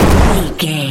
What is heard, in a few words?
explosion large no tail